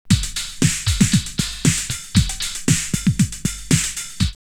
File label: Acid Brain 117, drumloops Rave; X-Static Goldmine; 90 s Sample CDs